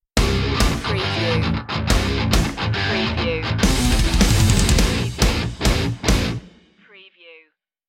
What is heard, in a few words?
melee master stinger